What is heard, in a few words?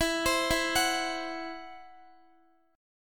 E Suspended 2nd Sharp 5th E, Guitar, 4F,4A,5C,5G,5C,6E; arpeggiated; Esus2#5; x,x,16,17,16,14